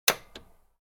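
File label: click button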